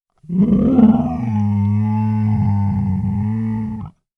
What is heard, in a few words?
wild animal hungry grunting